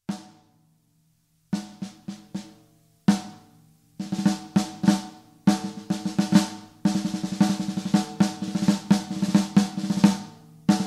big band snare

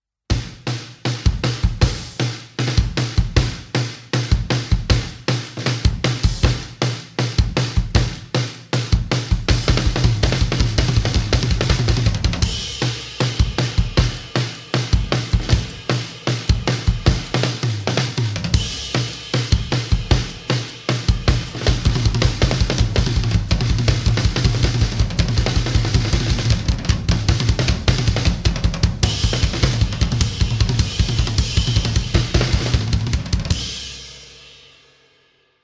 big beat glitter lo tuning up tempo

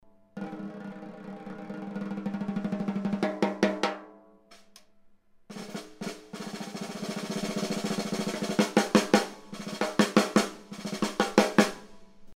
big band snare

